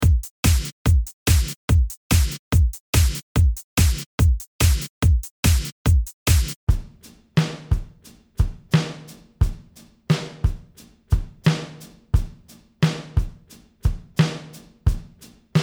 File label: sample percussion